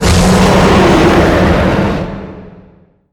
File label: shield roar